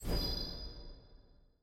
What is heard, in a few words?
sfx collection champion details; tft set17